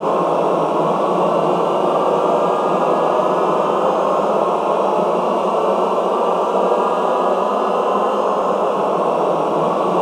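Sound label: Glissando ENDL, Classical Choir; Best Service; Extended Classical Choir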